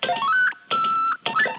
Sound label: buttons